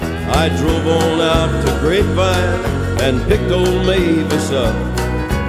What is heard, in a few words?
music; wu xing; earth